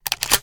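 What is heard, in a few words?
shotgun reload loop shell in; reloads; iw8; romeo870; gmod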